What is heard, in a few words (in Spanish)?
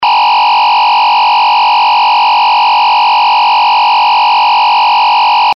tono; sirena electronica 32 sonidos 115 230v ca